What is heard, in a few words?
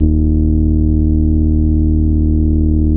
SLAP D#1, Bass; E-MU Producer Series Vol. 6 – Analogia Project (CD 1); Boom da Bass 1